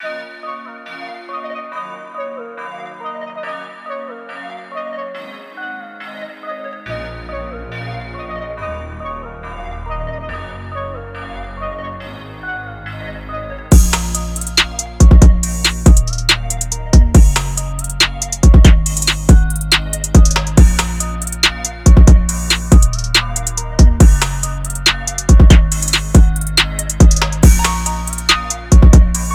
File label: Drumkit; TM-88; SHOBEATS